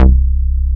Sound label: SH101; OLD ROLNDS